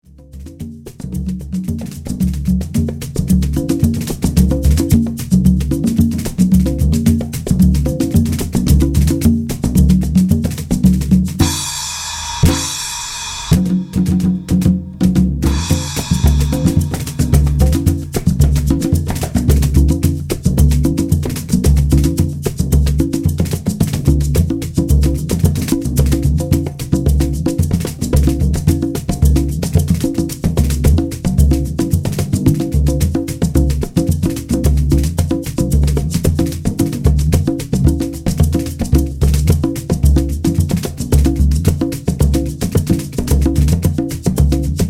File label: samba melody